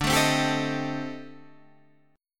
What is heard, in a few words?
A-Minor 7th Flat 5th D, chord; Am7b5/D strummed; JGuitar